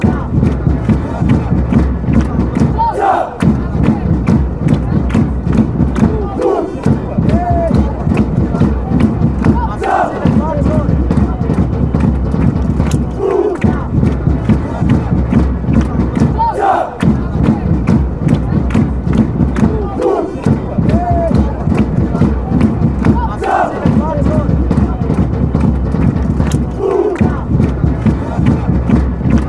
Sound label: greece chant